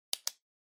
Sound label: Ballpoint Pen Click